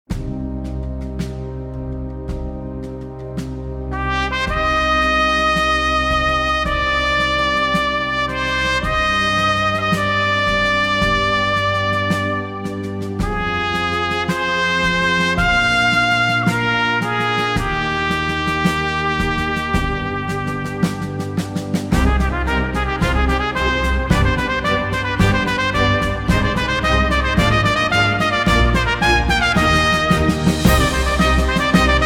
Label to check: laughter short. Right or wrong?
Wrong